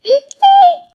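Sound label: success reward bright happy; celebration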